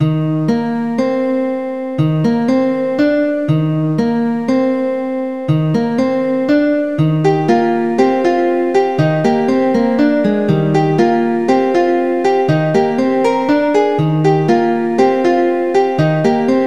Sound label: relaxing guitar, chiptunes